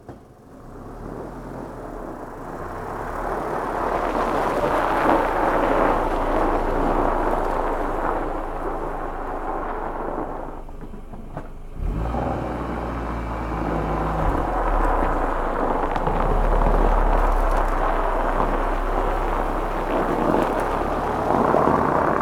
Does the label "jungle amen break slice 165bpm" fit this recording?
No